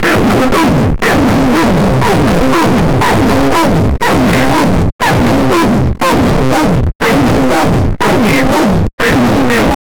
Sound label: smooth gear shift of a, racing